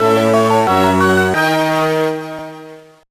Weapon Found; Monster House; GBA gamerip 2006

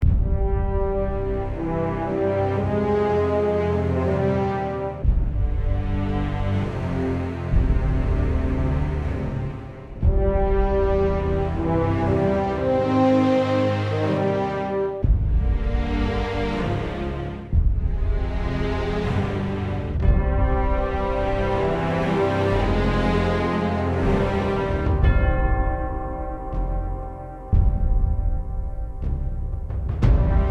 Explore Minimal

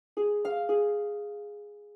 Harp; Arc Apellago

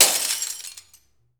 glass break

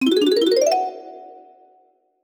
Collect Watermelon Piece; Donkey Kong 64

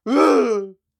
Attack; False Knight

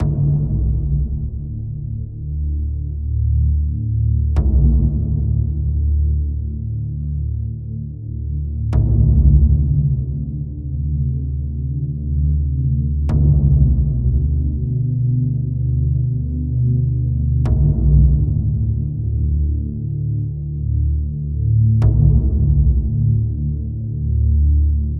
Siberia Cave; serious sam siberian mayhem 2022 pc gamerip

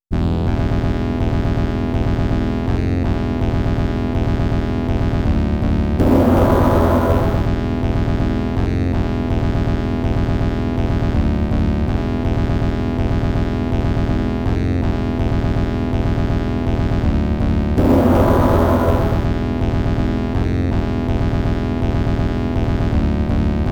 Cave; Golden Axe

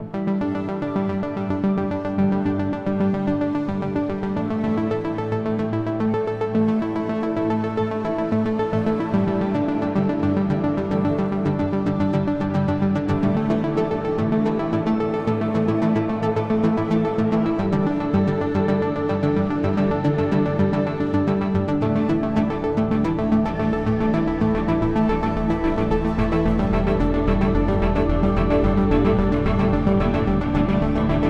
LAST PUZZLE LOOP; escape academy

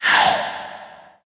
Info Swoop In; candy crush saga gamerip

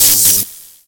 Pop

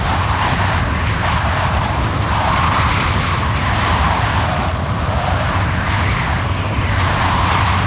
Downhill Whoosh; Nicktoons Nitro; arcade

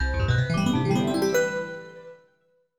opening music

